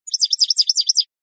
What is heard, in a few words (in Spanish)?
bicheada rápida; campero